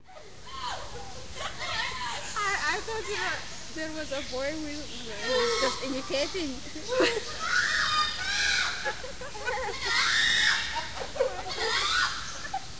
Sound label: parrots